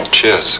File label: cheers